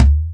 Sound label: low mid tom drum sample d key, tom sample tuned to the D note. Loudest frequency: 223Hz